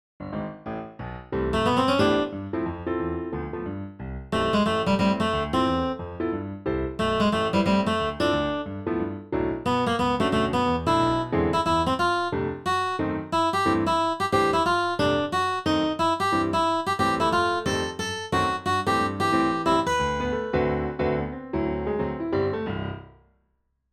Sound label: TENOR accomp melody